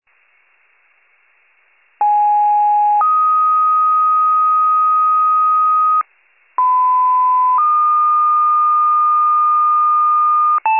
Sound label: FIRE pager alert